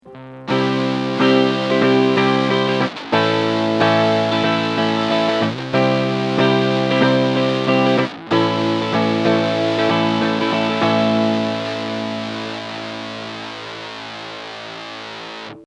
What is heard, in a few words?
gretsch